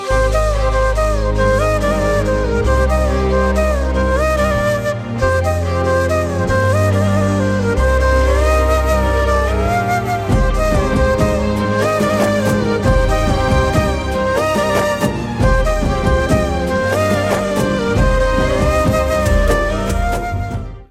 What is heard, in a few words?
Flute Bgm